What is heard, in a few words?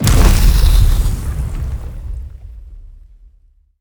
skill screen attack